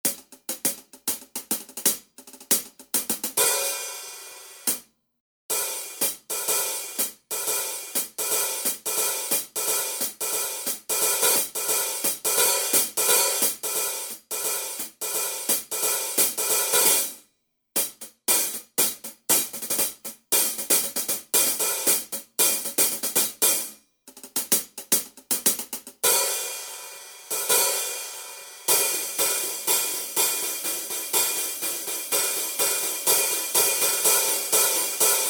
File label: hi hat super kit, ketron audya